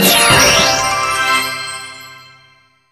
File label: (Jingle) Recipe Complete!; StreetPass Mii Plaza; Feed Mii